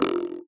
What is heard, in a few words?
Vocoded Drums; RadioactiveSandwich - Homunculus